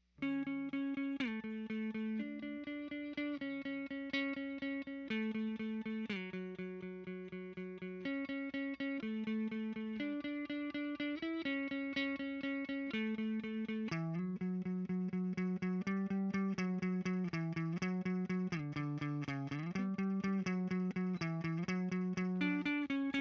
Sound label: guitar